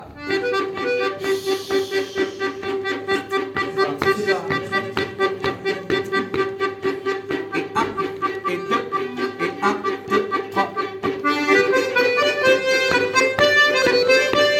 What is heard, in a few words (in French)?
rythmique violons; Mieg torn 1